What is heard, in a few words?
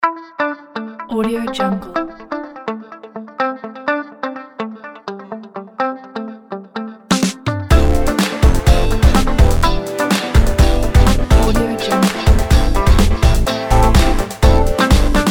groove